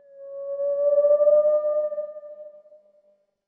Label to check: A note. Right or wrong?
Wrong